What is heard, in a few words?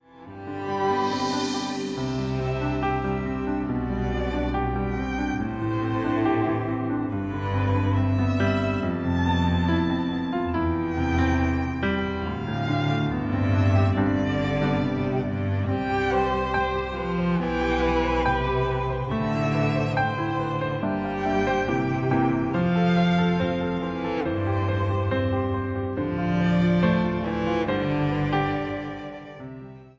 Soothing Healing, ringtone